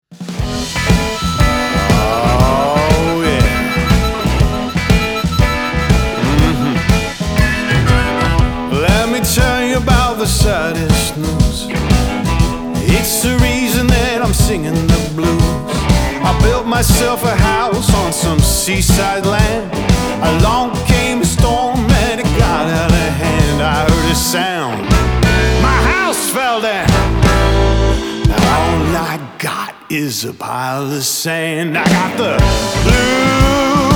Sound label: sand pile blues